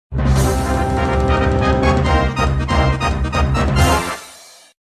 Cheerful Studio

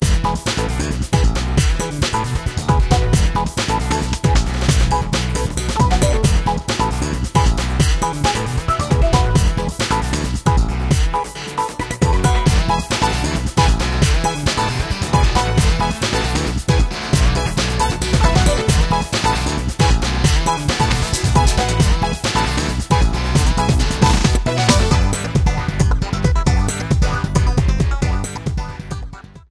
Hammer Whacker; SPM

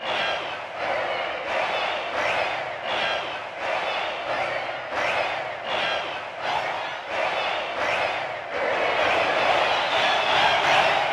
Indoor Cheer; PMCS; Roshambo Temple

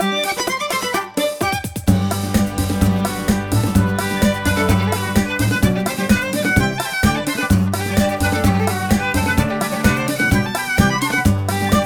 Moo Moo Meadows Frontrunning

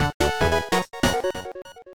Music Fanfare; Toad

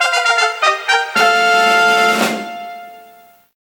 Level Up; Dragon Quest Series

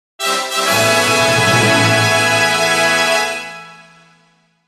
Level Up; MKT

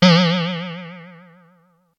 Cartoon, Boing - Space Boing - Low

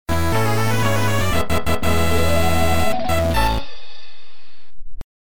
Stage Clear; Yoshi Topsy-Turvy

